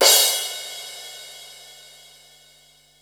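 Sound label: cymbal crash; 300 Drum Machines; Yamaha Motif ES-Series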